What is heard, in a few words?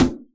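electronic snare; Dr 202 Kit 1; Percussion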